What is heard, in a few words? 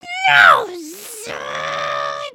collapse; emotes